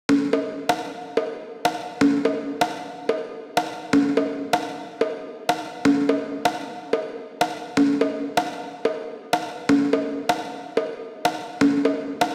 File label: Conga Chamber